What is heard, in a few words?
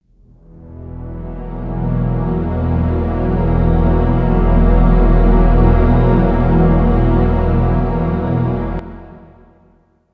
Secret Door; Clock Tower 20th Anniversary Sound Collection